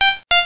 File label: pipo